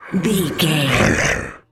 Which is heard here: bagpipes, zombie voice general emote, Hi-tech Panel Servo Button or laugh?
zombie voice general emote